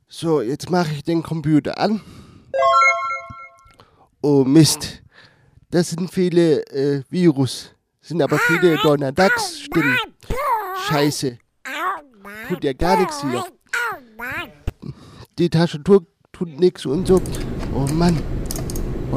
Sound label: jingle